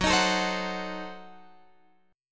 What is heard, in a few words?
Gb Diminished 7th, Guitar, Open F; Gbdim7; strummed; x,13,15,16,15,13